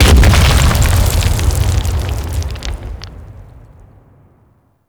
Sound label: Generic Environment Explosion; Gameplay; Warframe; The New War; Mission Four